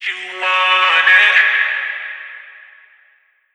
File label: phrase E, Vocal; Slate Digital Omnivox Vocal Sample Pack